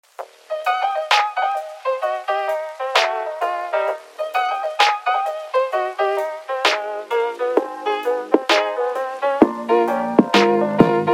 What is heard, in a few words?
Jazz Beat